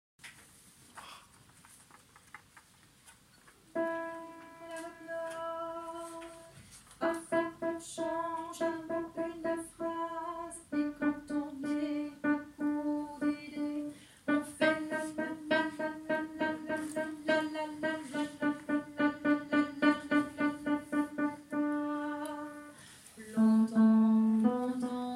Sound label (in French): altos